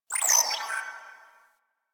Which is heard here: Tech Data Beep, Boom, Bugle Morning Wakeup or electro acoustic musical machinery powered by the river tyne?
Tech Data Beep